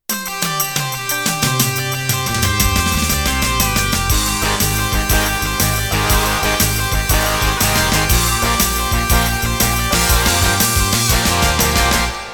Shuffle Intro

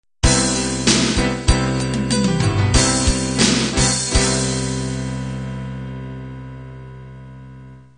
rock ballad end

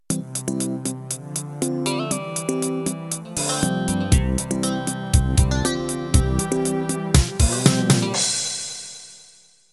Hip Hop Soul intro

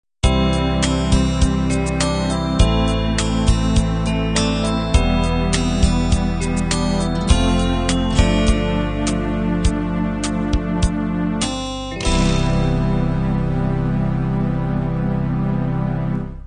pop ballad end

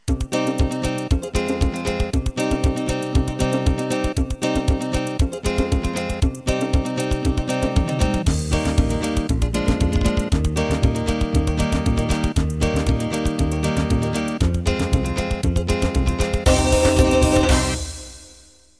Gypsy

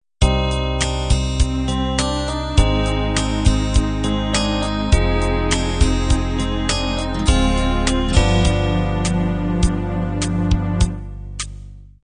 pop ballad intro

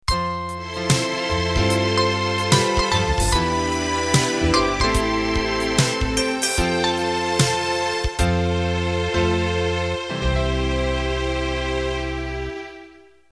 Swing Rock End

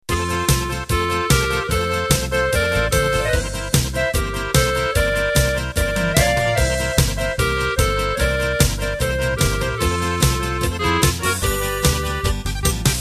Polka Bavarian intro

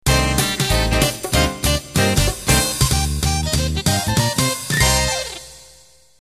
Ballroom Swing End

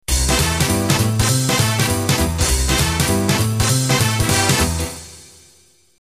New Jive intro

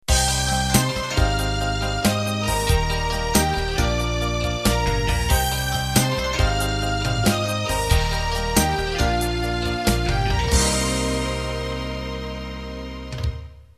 Slow Rock End

